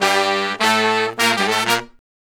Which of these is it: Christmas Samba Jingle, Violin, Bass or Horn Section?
Horn Section